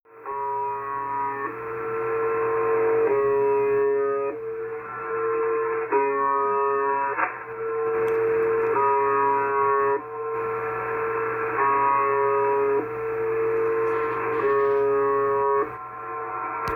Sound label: Buzzer Sound